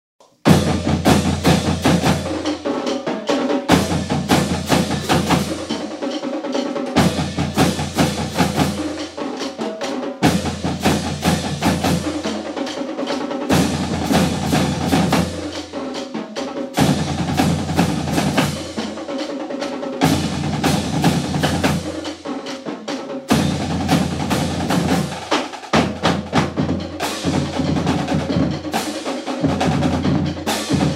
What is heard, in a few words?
drumline, marching band